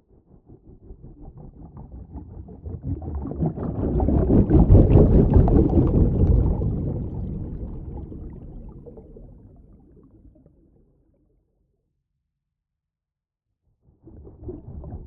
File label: Underwater Designed Whoosh Propeller Long; Spectravelers; Submersion